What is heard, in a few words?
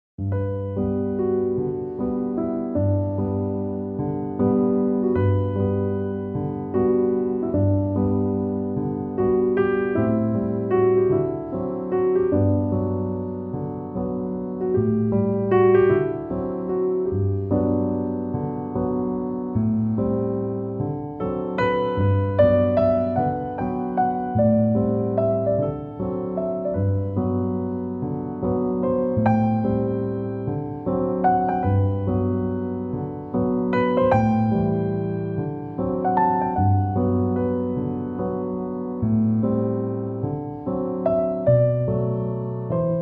Bossa